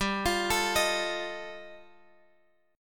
G 7th Suspended 2nd Sharp 5th G chord, Guitar Drop D